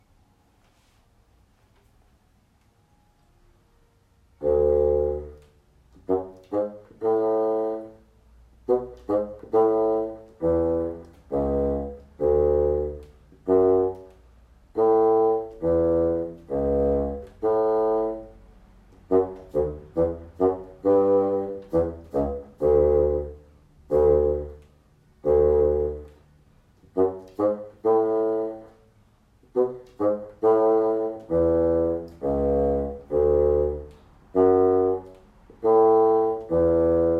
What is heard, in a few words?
Passacaglia Bassoon